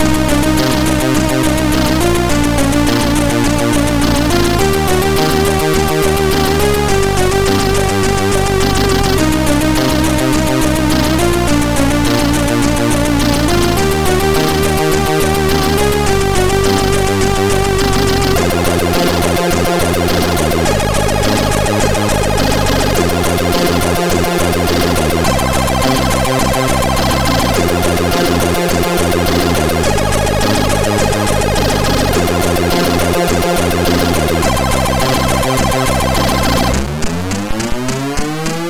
sid, MOS6581R2; Short; hvsc

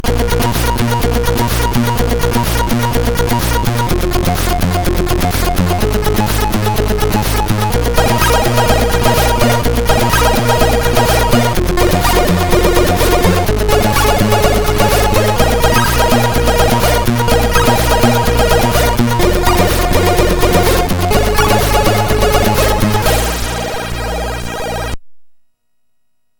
Game Music, sid MOS6581R2

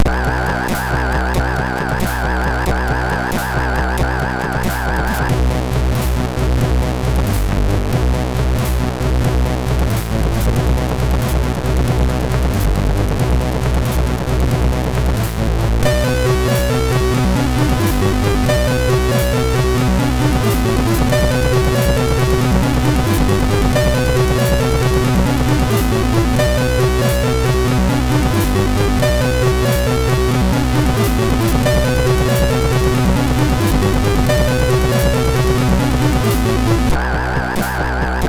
Disillusion, sid, MOS6581R3

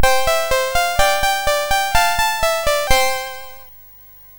Clik Clak, MOS6581R3

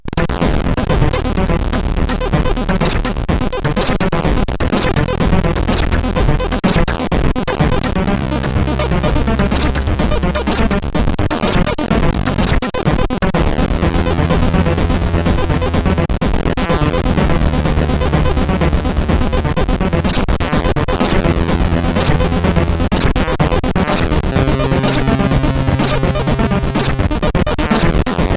Sweet Funk, CSG8580R5

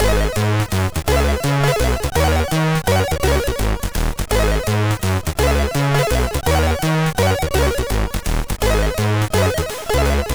Lowland Jazz, MOS6581R3

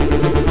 Robot, MOS6581R4

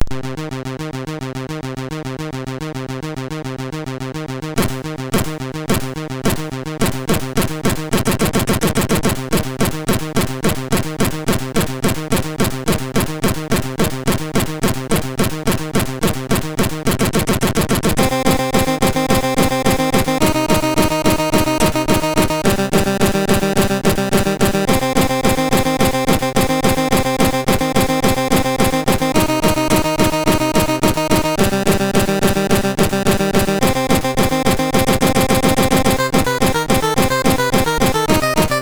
Fast Death, MOS6581R2

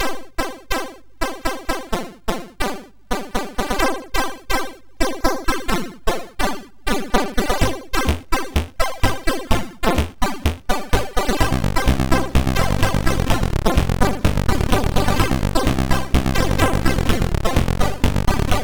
Short n funky, MOS6581R3

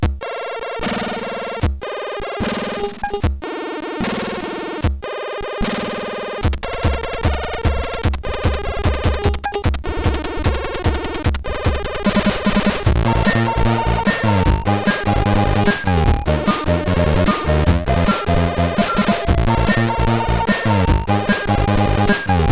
Disco, CSG8580R5

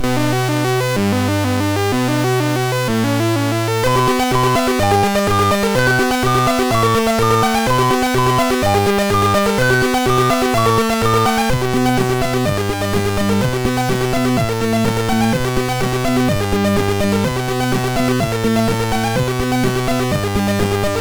Realtime, MOS6581R4

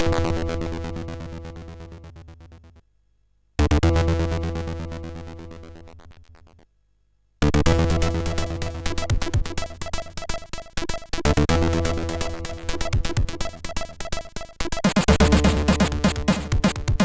note CSG8580R5

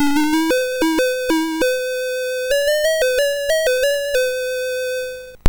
BASIC, MOS6581R2

